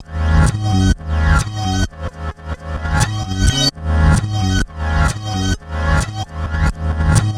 Chd Fx Loop 130; Equipped Music; Loungin House

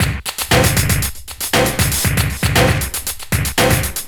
Drum Loops

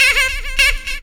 vox special

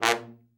TROMBONE; Best Service

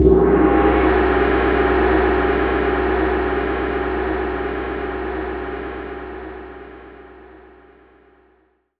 Tam Tam; Roland M-OC1

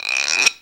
SLOW, GUIRO; AKAI S-Series CD-ROM Sound Library VOL-5

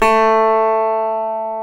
GTR, Dobros, National

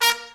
SECT BRASS FX

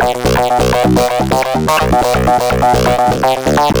K2000 Techno, Keyboards

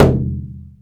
BODHRAN, ETHNIC PER; AKAI S-Series CD-ROM Sound Library VOL-5

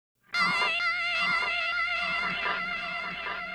Hardcore Breakbeat, 130 Cmin; Big Fish Audio; Hardcore Breakbeat Action; Gotham

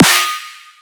pan snare

